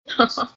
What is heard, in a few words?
chuckle